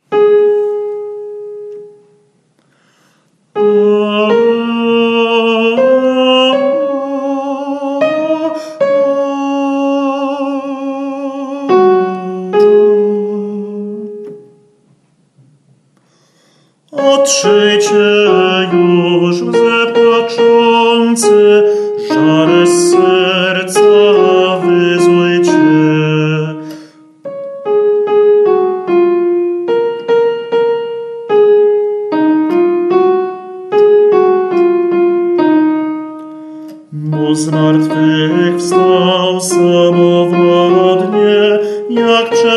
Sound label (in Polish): SOPRAN; Otrzyjcie juz lzy